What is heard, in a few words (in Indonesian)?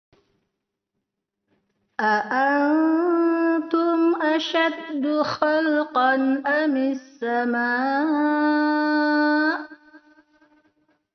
Perekaman baru Karaoke denoised